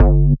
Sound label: Bass Shot G